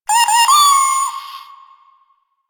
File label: Cat Loot Crate Meow; Warframe; Nineteeen Ninety Nine; Cat Loot Crate Common